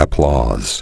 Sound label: applause